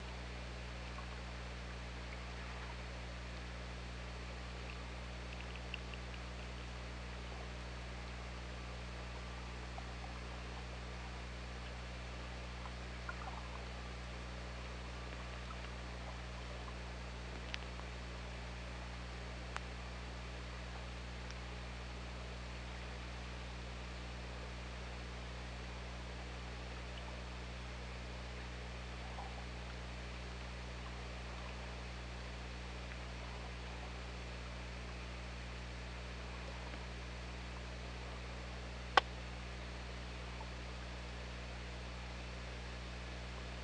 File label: OrcaLab